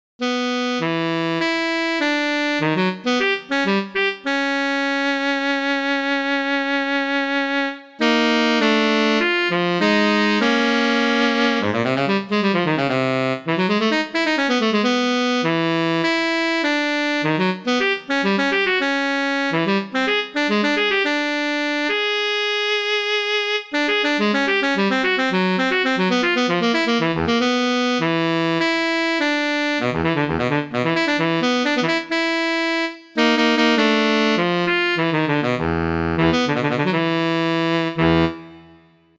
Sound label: sax solo